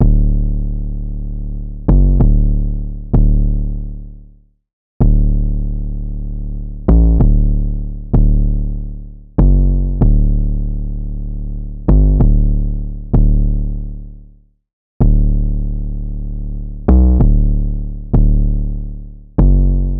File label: 808 loop 96 D; Slate Digital BASSHOLE Sample Pack; 808 Loops